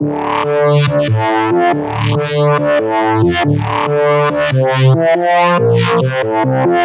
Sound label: Riddim Synth Loop D (140); Reek; Ghosthack; Riddim Essentials 2020